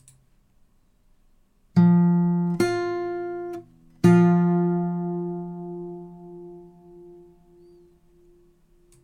octave, guitare

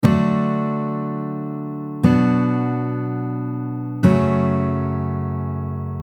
Avec capo, fingerstyle guitare

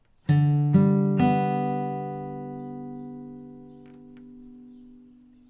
Sol majeur, guitare

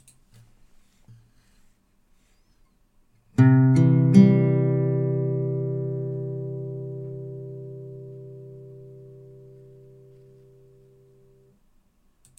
accord de DO, guitare

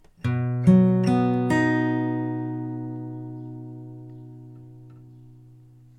Si majeur, guitare

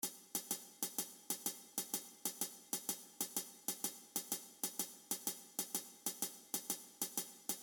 Interpretation rythmique, guitare

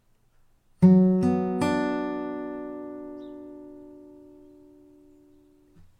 Si mineur, fingerstyle guitare

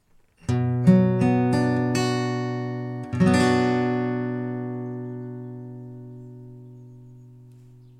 Si mineur, fingerstyle guitare

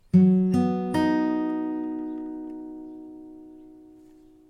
Si majeur, guitare

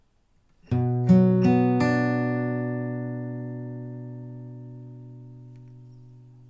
Si mineur, fingerstyle guitare

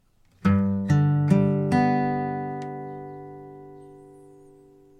Sol majeur, guitare

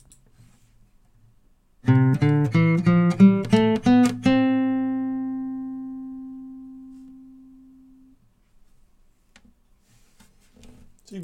gamme de Do, guitare

